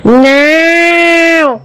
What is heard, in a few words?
scream